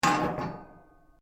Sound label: METAL ON METAL; Metal (Sound Effects:Materials)